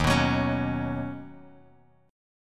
D sharp Minor 7th Sharp 5th D sharp, down, Bass Standard; strummed; JGuitar